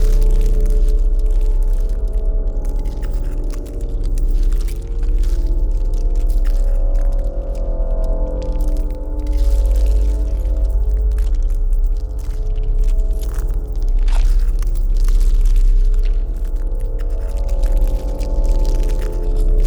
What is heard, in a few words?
pro ship science station idle; biogenesis